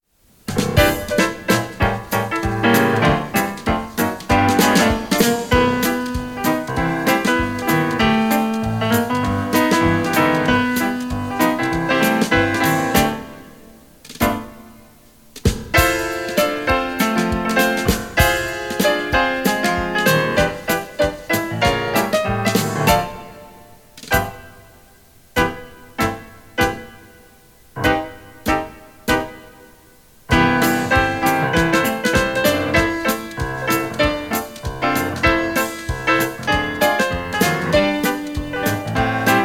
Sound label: Quick jazz played faster, tap advanced 1